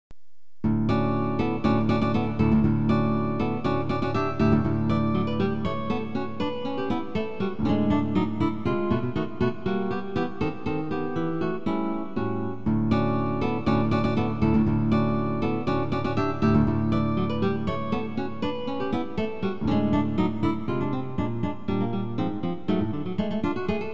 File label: suite guitar